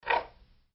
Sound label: pickup